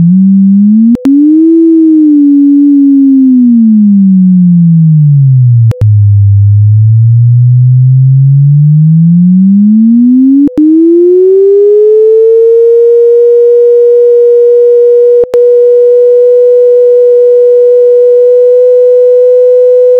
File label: audio ticks; Nili Fossae Mystery Mineral 3 audio; Olivine; Volcanic